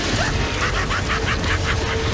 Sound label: Insane Laugh